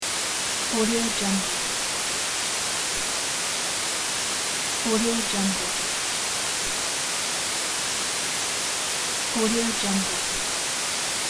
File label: white noise